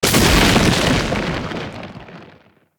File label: Random Lightning Discharge; Characters; Enemy; Abilities; Nimrod